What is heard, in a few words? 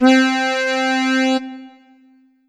Roland JV 2080 Bandoneon C4